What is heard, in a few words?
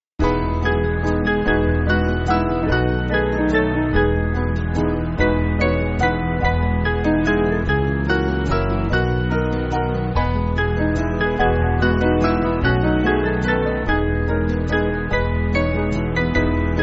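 Band, Music Backing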